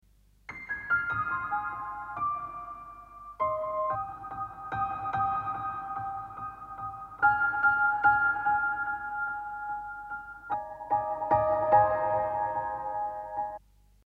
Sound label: PIANO